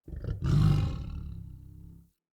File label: Wolf Idle Grunt, Animals Wolf Vocals